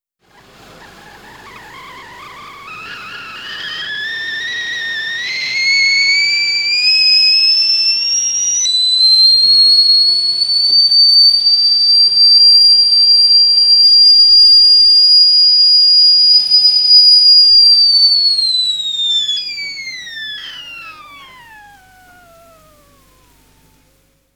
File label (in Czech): konvice